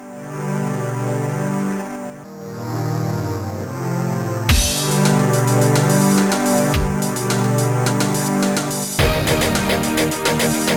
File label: magic noises; MED